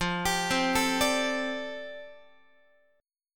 F 6th Add 9th F down Guitar, chord

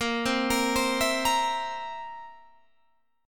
Bb Suspended 2nd Flat 5th, Guitar, Open G

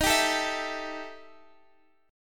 E Minor Major 7th Double Flat 5th D sharp

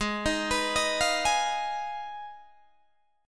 G sharp Minor 6th G sharp down Guitar Open G

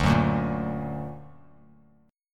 D Augmented D down Bass Standard; D+ strummed; JGuitar

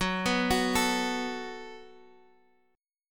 F sharp Minor Double Flat 5th, guitar; arpeggiated; x,x,4,4,7,5; down

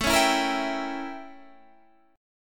A 7th Flat 9th Guitar Standard; A7b9 strummed; JGuitar

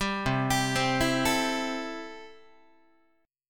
C 6th C down Guitar; C6 arpeggiated

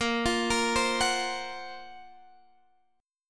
Gb Major Sharp 11th Bb

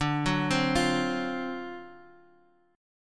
D 7th Sharp 9th; x,x,x,x,2,1,2,2; arpeggiated; JGuitar

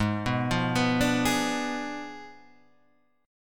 Ab Diminished 7th Ab Guitar Standard; Abdim7 arpeggiated; JGuitar

